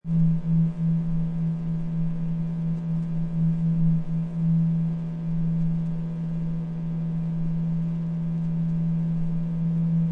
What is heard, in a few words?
electronic rhythm